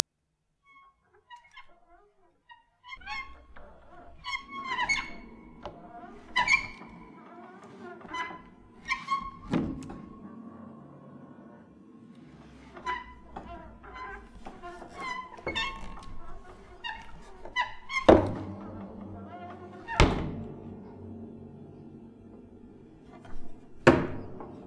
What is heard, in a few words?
Squeaks Thumps, Piano Destruction